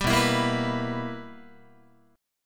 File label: B Major 7th Suspended 4th Sharp 5th Guitar, chord; BM7sus4#5; strummed; 10,7,7,8,8,10